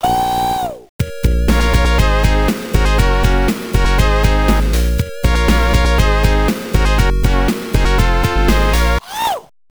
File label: Dance Attack (with voice); Michael Jackson's Moonwalker Genesis soundtrack